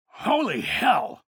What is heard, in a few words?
pain ability damage; ranger; low health